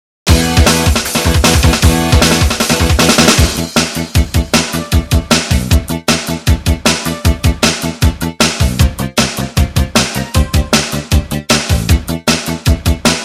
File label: 5th Temple Game (Battle 5)